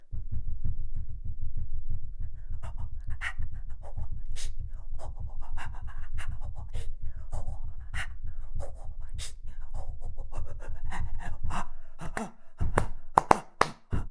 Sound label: stamping and breathing